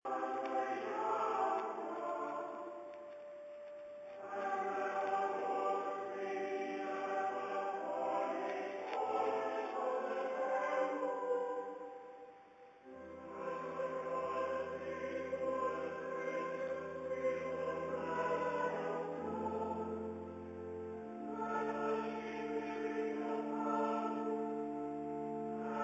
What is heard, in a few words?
choir in St George's chapel Windsor castle